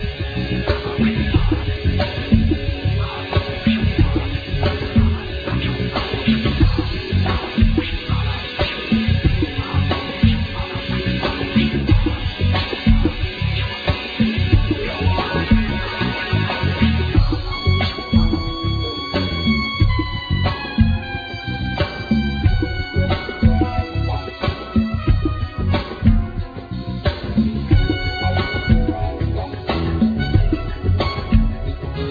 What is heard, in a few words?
Trance Groove